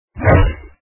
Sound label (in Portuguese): cavaleiro; anacroz